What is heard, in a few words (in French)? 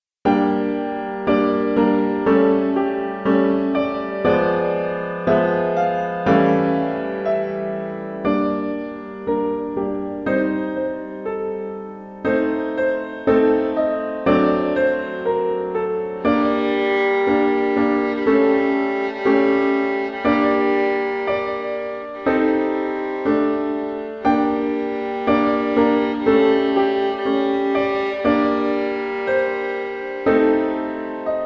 Violon et Piano